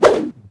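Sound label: Attack One Sword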